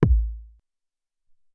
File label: Ele Kik, Alesis SR-18